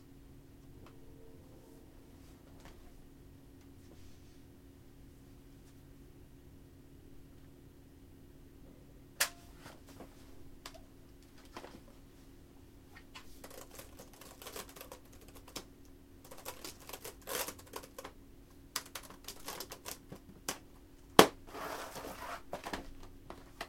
Improvisation For Laptop Computer With Distant Train